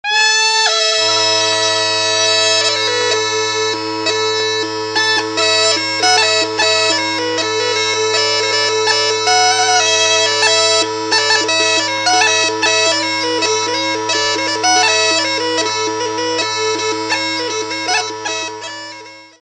Duda solo

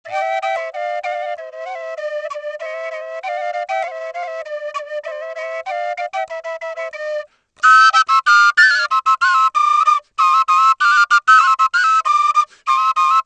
Dvojnice solo

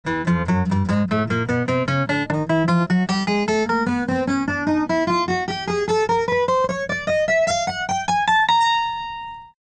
guit 12

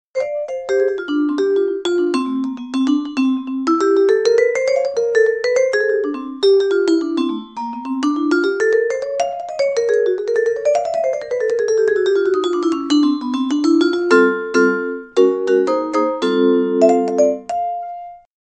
vibraphone solo

